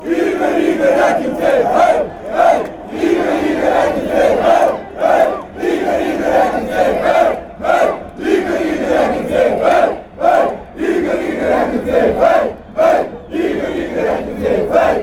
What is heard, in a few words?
chant, fanchants; cska